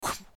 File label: Woosh; Assemblee SFX